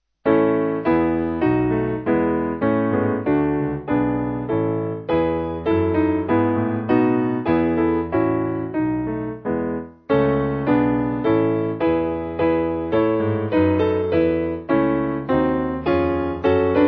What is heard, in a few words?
Piano; Strengthen For Service